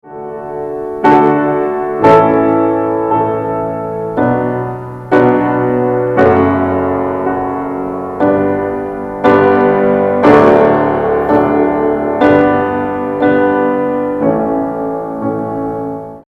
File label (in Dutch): akkoorden straight voor loop